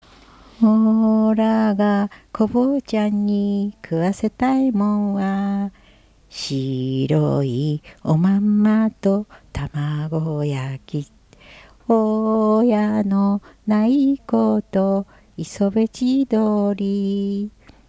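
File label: lullaby; minyou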